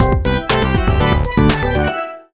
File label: Win